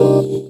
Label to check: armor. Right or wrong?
Wrong